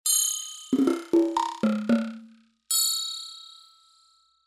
alien

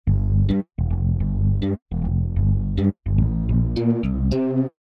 fatten

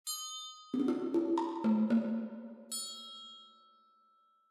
church

